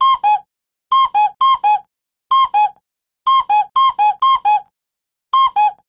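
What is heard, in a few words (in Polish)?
kukułka; Mirosławiec Górny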